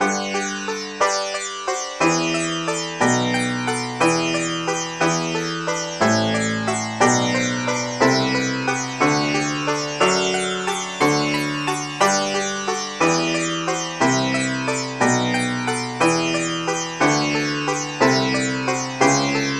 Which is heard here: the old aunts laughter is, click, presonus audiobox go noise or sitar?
sitar